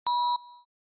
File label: Activation sequence alarm single alert